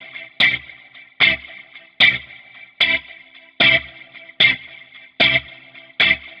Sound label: Rotator, сэмпл гитары регги